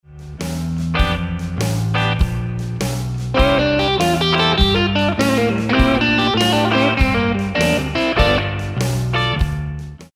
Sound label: Reconsider Lick, guitar